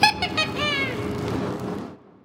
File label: laugh fire; Clawberta; Android, iOS